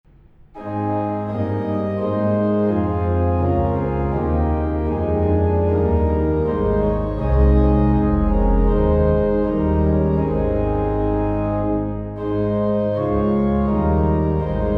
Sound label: ststephen, hymns organ